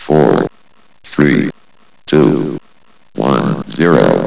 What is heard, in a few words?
timer long